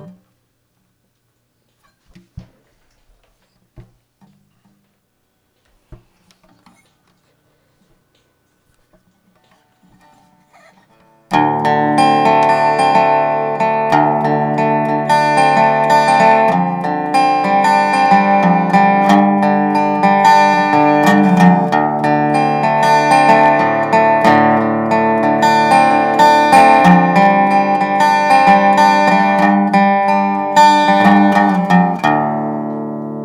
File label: Dobro